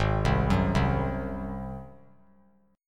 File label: Ab 7th Ab down; Ab7 arpeggiated; JGuitar